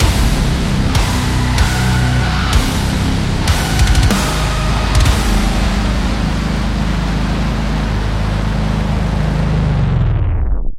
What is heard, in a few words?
lose